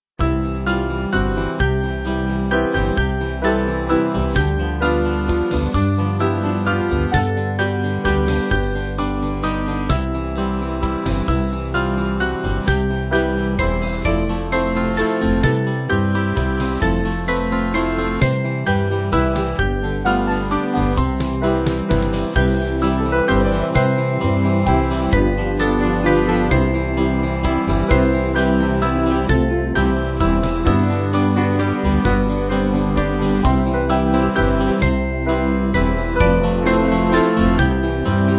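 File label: Band, Music Backing; Under His Wings; Hingham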